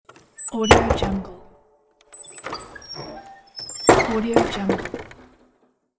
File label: open and close the wooden creaking door; kaelv